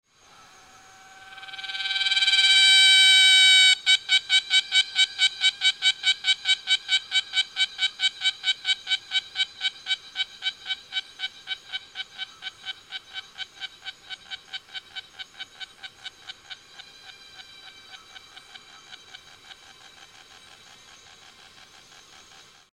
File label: Pomponia pendleburyi